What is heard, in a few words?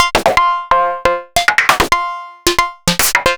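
ms20 processed percussion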